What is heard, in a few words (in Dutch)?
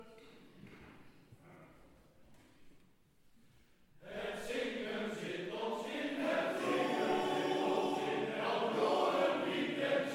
Club lied Mannen koor; Ettens